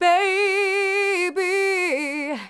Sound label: Female vocals and robo-vox